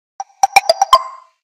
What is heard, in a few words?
On time Default Calendar, notifications